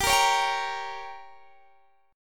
G Diminished, Guitar Standard; strummed; JGuitar

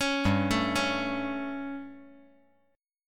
G Suspended 2nd Flat 5th G, chord

